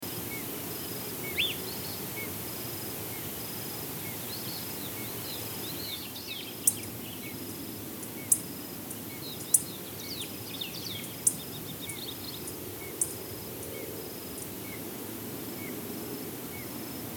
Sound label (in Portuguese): SONS DA NATUREZA FORMACAO CAMPESTRE; JARDIM BOTANICO